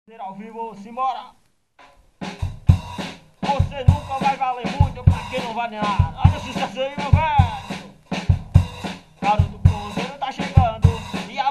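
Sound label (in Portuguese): forro pout pourri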